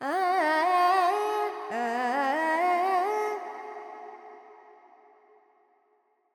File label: FX Dorian, Vocals, Carnatic Female, Alaps, 70 G